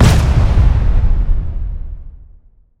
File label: blink overwhelming; dota